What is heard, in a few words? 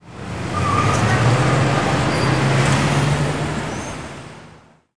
TRAFFIC, commercial